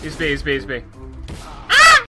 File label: Scream